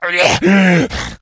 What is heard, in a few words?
death, infected; Left 4 Dead 2; Source Engine